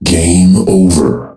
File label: Game Over; match3